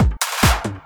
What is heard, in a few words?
Fill 140 BPM